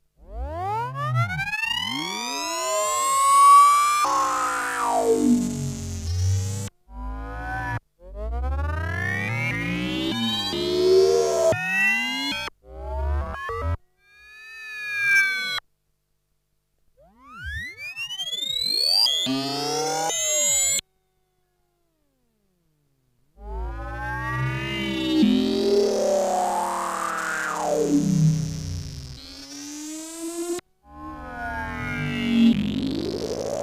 Filter Sweep Multiple Mod, CS-30; This is the same as the previous sound, but even more modulation has been applied.; The LFO is modulated by EG1

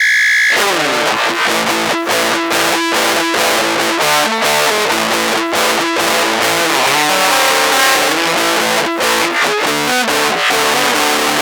Fuzz Test

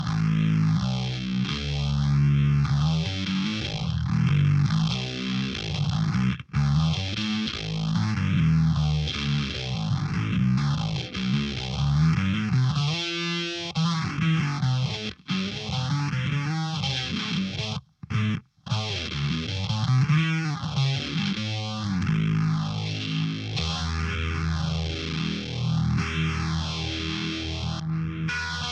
Fuzz Phaser Rick, played on a Rickenbacker 4001; ARIA/DIAMOND FUZZ(IBANEZ STANDARD FUZZ)

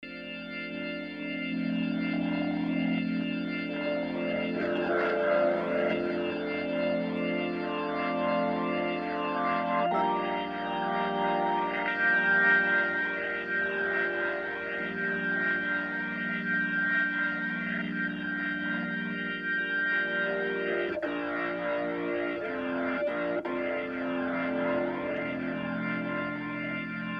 Drawbar Pull, HammondT522; Pulling different drawbars through the overdrive to show the effect of each drawbar on the sound.

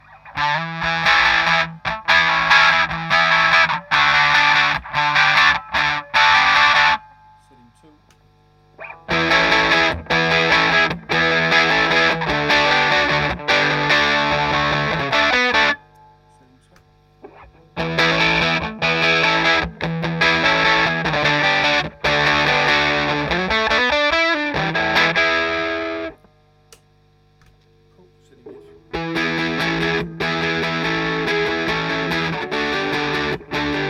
Vox Conqueror

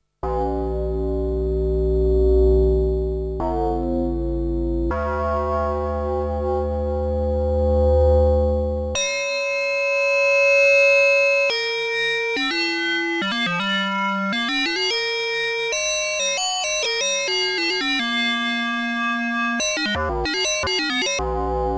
Tolling Bell; CS-30; Here is another example of bell-like timbres.; Tolling Bell 2; When you have analog FM, you also have bell-like timbres

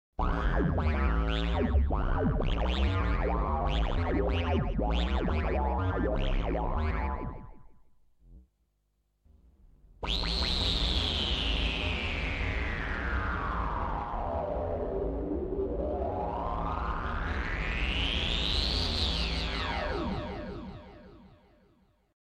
Sample And Hold, Korg 05R/W; And of course you can modulate the non-existing resonant filter too!; Now, who said the 05R/W has no resonant filters?

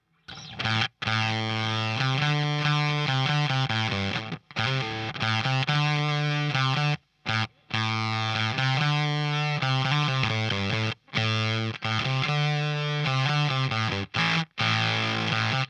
Fuzz Tele, played on a Squier Tele; ARIA/DIAMOND FUZZ(IBANEZ STANDARD FUZZ)

